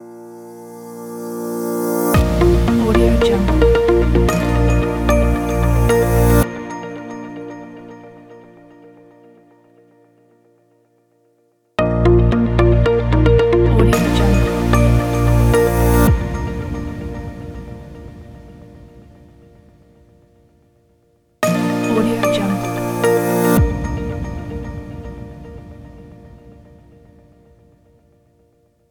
presentation logo

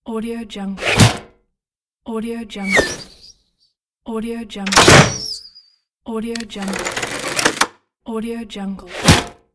crossbow

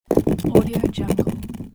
rocking rock rumble

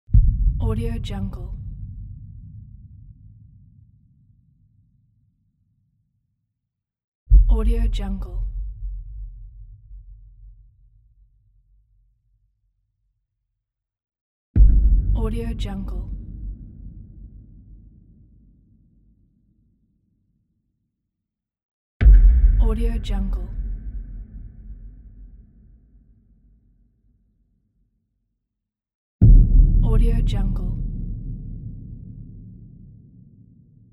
boom

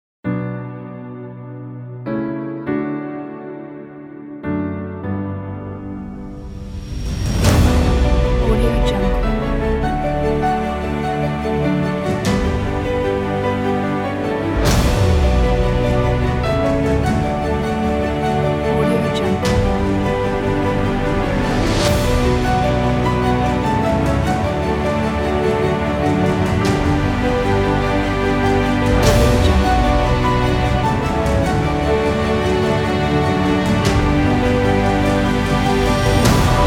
epic piano background

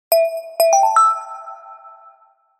simple logo melody; macro-media

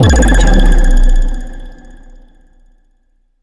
impact

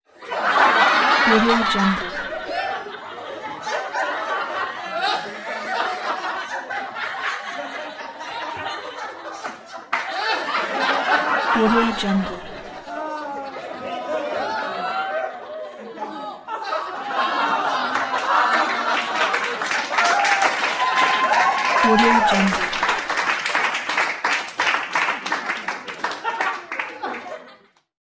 crowd laughter

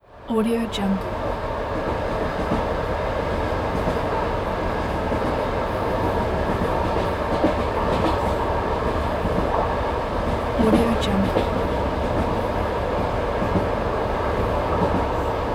atmosphere inside passenger train

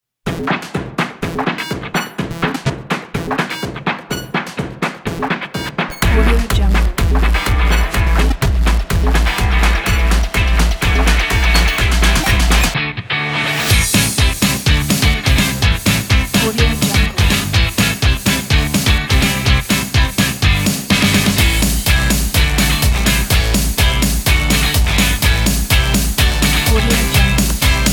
upbeat energy sport

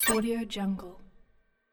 futuristic digital interface beep and click

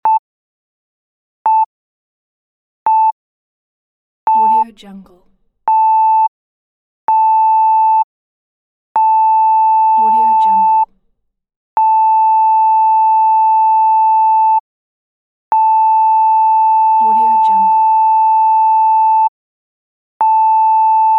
beep

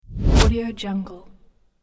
arrow whoosh sound

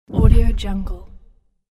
distant boulder fall

